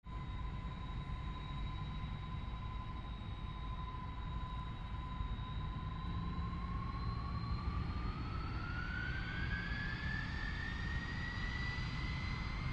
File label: Aircraft Jet By 747